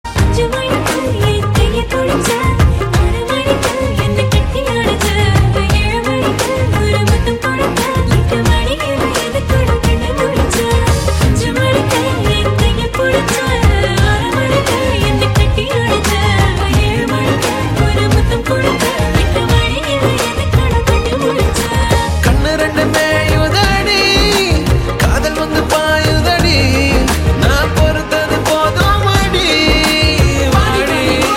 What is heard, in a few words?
Female Love Ringtone